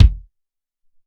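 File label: Close Kick 1, Alesis SR-18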